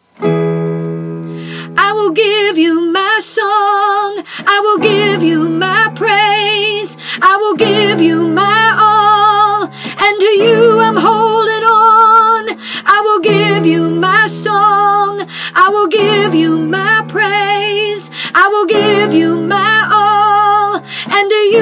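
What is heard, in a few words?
i will give you my song soprano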